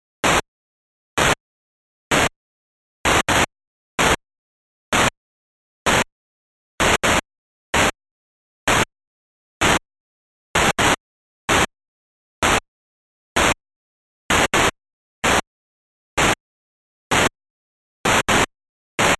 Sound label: noise